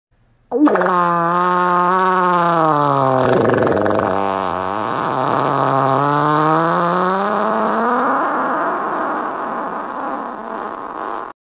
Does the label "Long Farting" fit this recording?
Yes